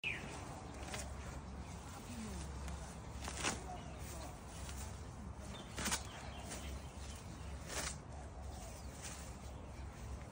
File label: Weeding; کندن علف های هرز